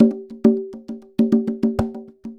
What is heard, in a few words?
Conga Loop (100BPM); musicradar